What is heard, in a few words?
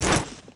pump throw, weapons